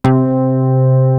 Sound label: SUN BASS, KORG; WS KORG; East West; Global Sounds